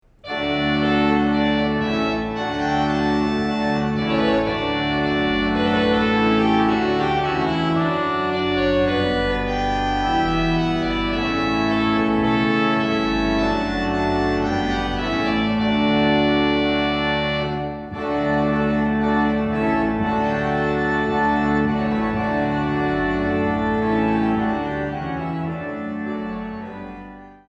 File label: Trumpet tune in D; wedding processionals; David Johnson